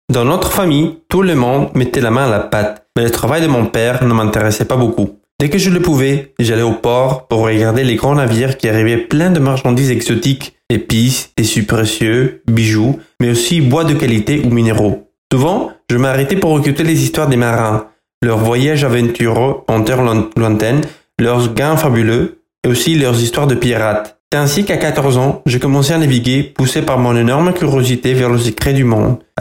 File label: Piano